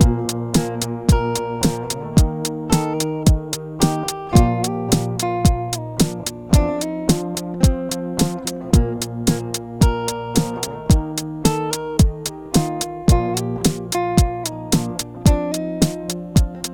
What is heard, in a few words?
live loop; SnootGame